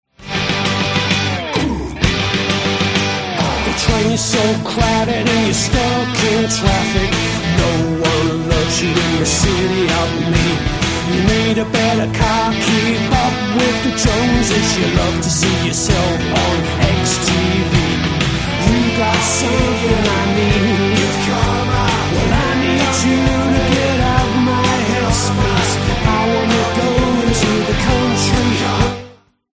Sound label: dangerous guitars